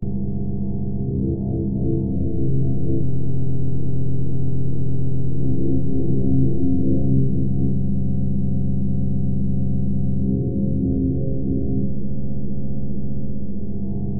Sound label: OSC LO; Bolder Sounds - Granular; SIBYLLINE